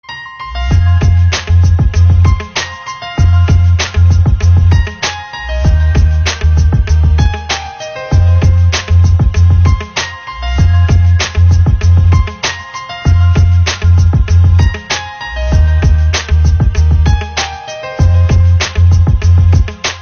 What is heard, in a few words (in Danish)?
Nokia 2700 Classic, ringetone